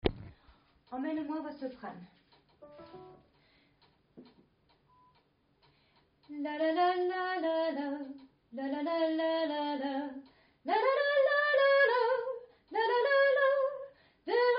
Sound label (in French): Soprane